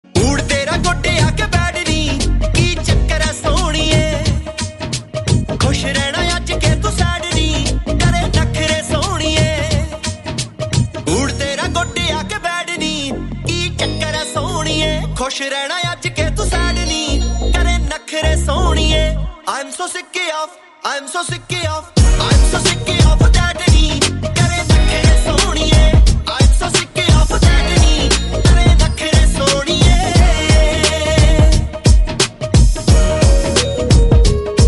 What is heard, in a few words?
Punjabi